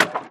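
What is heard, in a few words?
jump tree; skok drevo; crossthatroad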